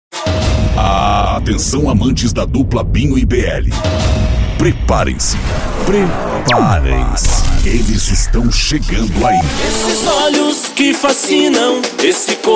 sertanejo abertura

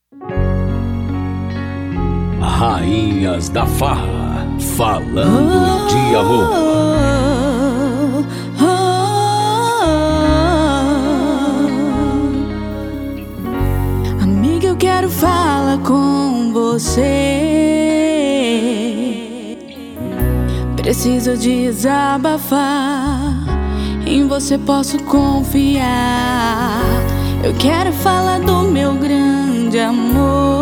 vinheta

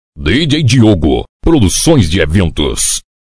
vinheta

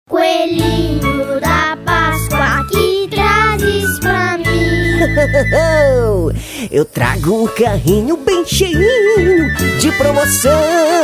jingle; pascoa do fenix supermercado

